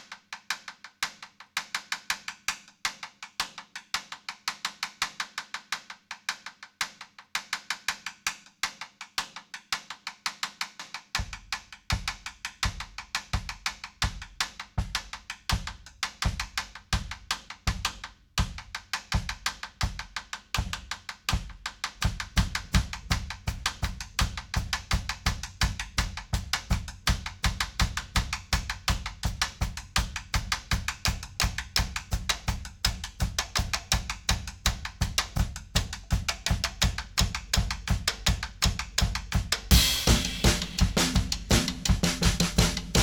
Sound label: drm Rhyt